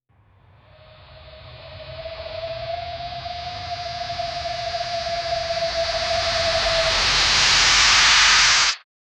a cold metallic creak

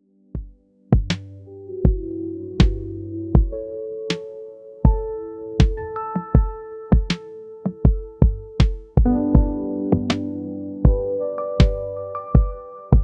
lo-fi chill beat blended

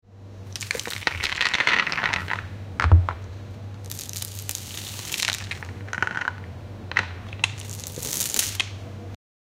a pile of burgers filling

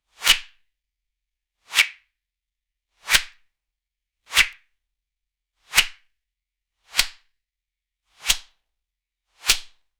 item grab with hand whoos

